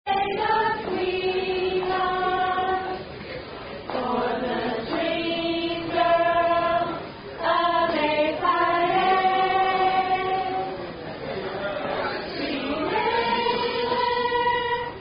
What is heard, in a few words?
Delta Sweetheart Sorority Song; Dst Stroll Bouqet Toss And Garter Removal